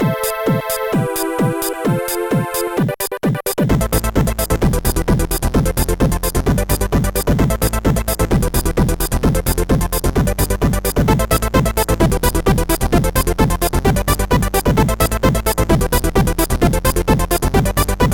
techno vibration; Cactoos

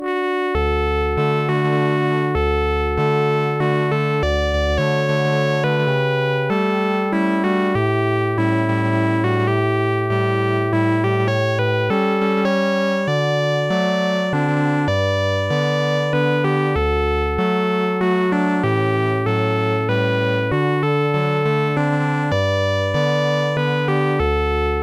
lullaby; Sonix Music Driver

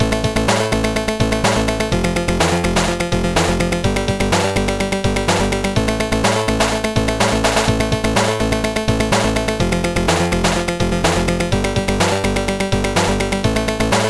chainsaw ditty, Trackers; Modland

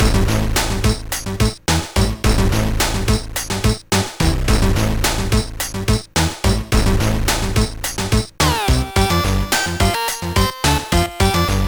beatbox; BLAIZER

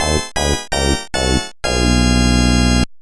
DownBeat MIX; MaxTrax

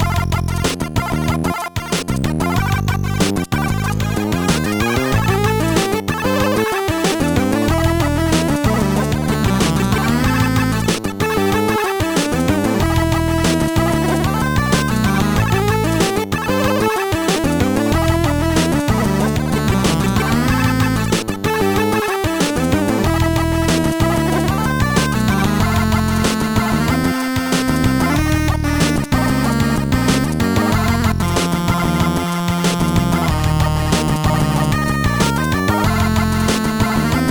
mod synth

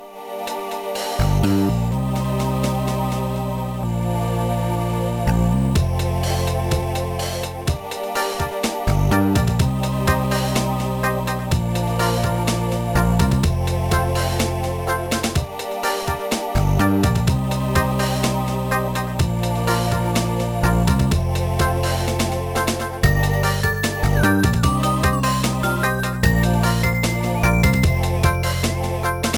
slow reggae feeling; Chipper; Trackers; Modland

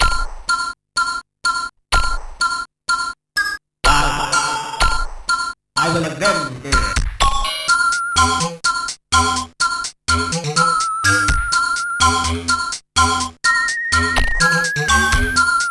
flutey; Valhalla 3

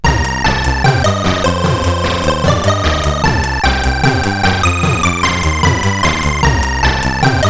sid CSG8580R5

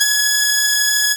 electric song; The Music Studio

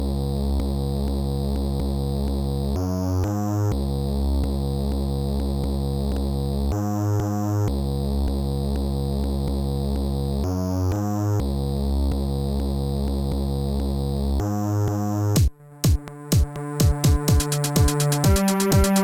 breakbeat groove; Trackers; Modland; Neuromancer

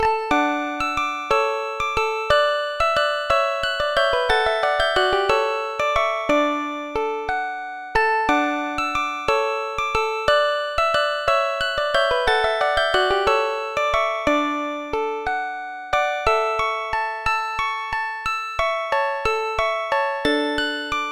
galloping; Deluxe Music Construction Set

